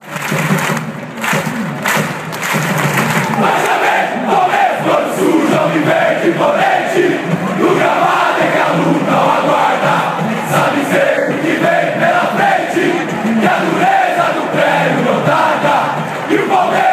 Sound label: chant, fanchants; palmeiras